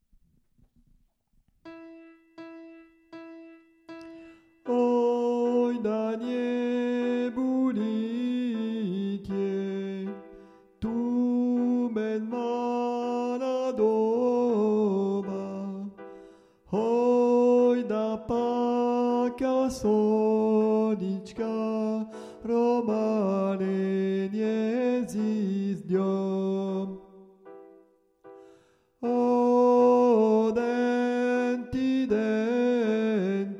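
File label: soprano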